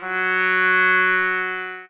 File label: sax